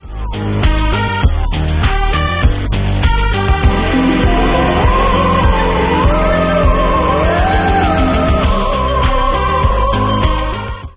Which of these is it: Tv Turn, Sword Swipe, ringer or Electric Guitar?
ringer